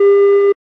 Aircrafts Cockpits RWR Scan; DCS

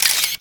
digital camera snap; Modern Warfare

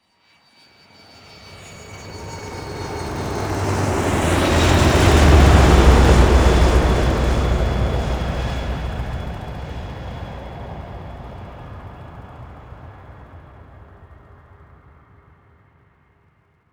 flyby long; buraq

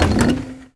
Ship Cannon Move; Civ4 warlords

